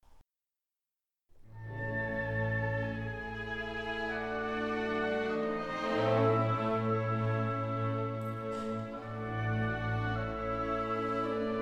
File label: Violin Con D